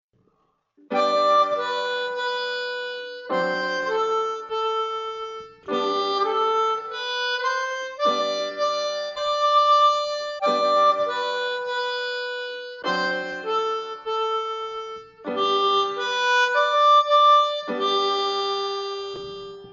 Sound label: Lightly Row, harmonica; German Folk Song; Hohner Diatonic 10H; Piano